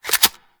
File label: Pistol Reload Mid; Weapons; Warframe; Tenno; Priest Pistol